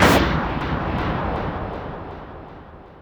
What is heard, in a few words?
sleep dart target; weapons; dota; riki